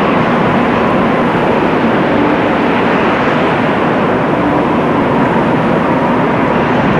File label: Desert Wind Anim Loop